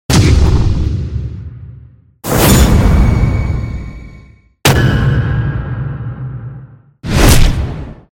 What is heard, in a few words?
parried